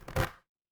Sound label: feedback error